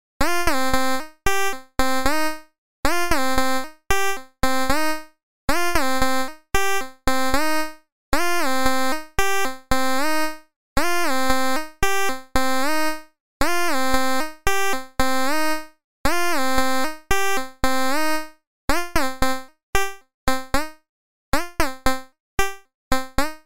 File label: CV Stepper gate length